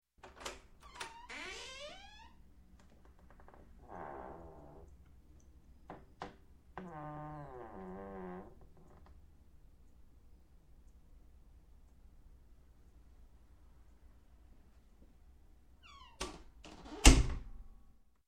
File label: Door, Wood Squeak, Open And Close; Sound Ideas 2000 Series